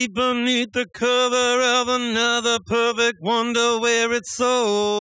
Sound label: informed estim voice; WASPAA11